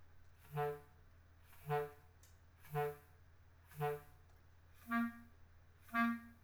mf normal, clarinet articulation

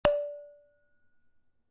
bellplate corner; bellplates

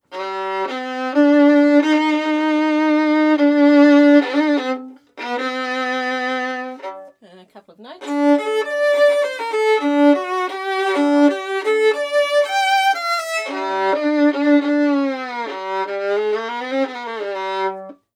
Baroque Bow Modern

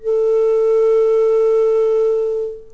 A4 diffuse, flute; modern

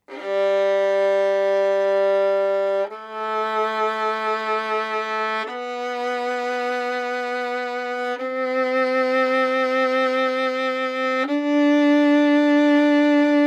scale Modern Bow Gut

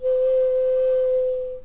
C5 covered, flute; modern

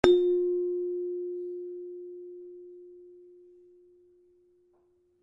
bellplate away from node; bellplates

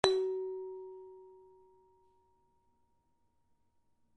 bellplate 10mm; bellplates

